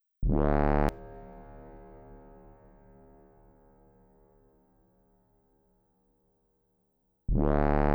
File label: 136 Bass; Zero-G Trance Inducer; Construction Kits; 136bpm